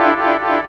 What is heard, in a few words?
DR. LOOP; X-Static Goldmine 3; CHORDS